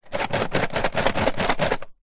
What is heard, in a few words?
office colouring pencils in tin shake